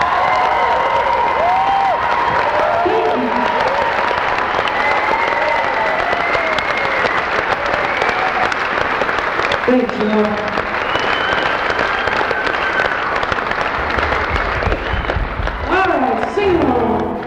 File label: crowd